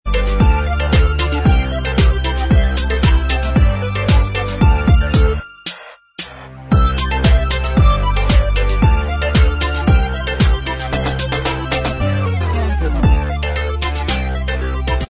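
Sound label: Instrumental Track, backing tracks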